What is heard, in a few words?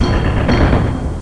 switch